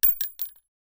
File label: cart